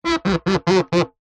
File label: leisure toy inflatable hammer rub squeak